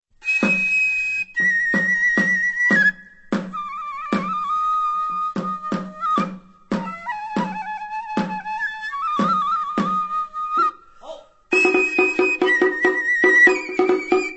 Japanese Taiko

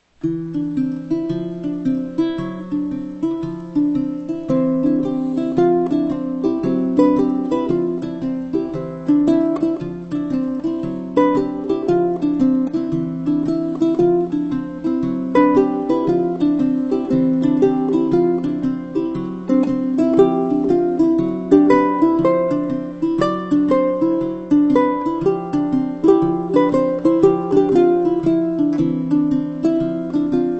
ukulele

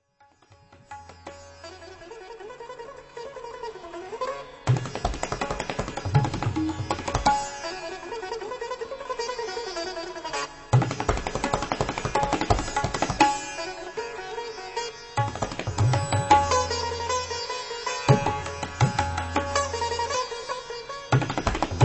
World Sounds Sitar

